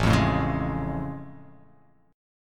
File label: A Augmented Major 7th A; strummed; JGuitar; x,0,3,2,1,3,x,x